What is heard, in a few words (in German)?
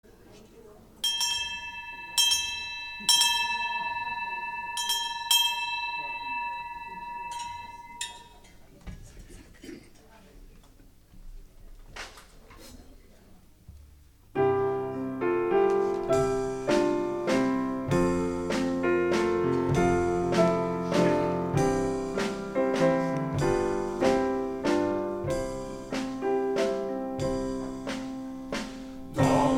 Glocke; Koelle; konzert 2022